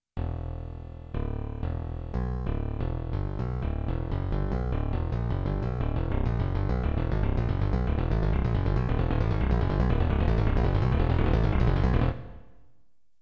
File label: acceleration